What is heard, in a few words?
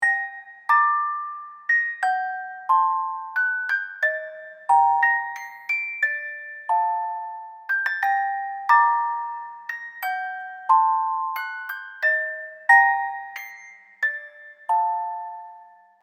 Eerie and dramatic valz music box loopable